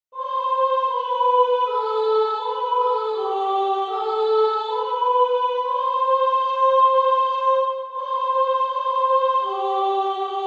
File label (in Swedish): sopran; Se vilken syn så underbar; julotta